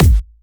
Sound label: Sub Perc, Bassdrums; Vengeance Samples